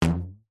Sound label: rubber band ping low pitched; foley